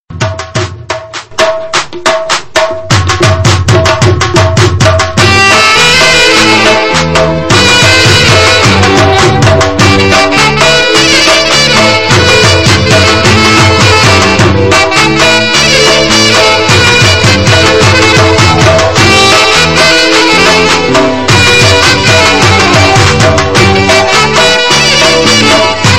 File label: Pakistani Punjabi